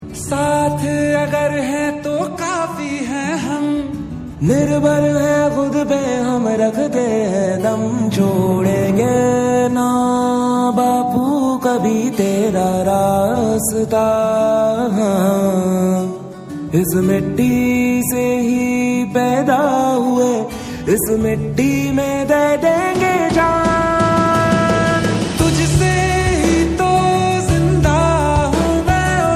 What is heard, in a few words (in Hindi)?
desh bhakti song